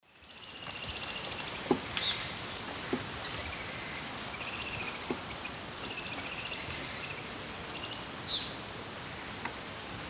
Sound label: Sublegatus obscurior, calls; S. America; Eastern Lowlands